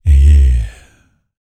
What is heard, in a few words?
Vocal Planet R&B Dance BASS CATCH; Spectrasonics